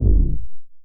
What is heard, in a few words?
hunger down; casualtiesunknown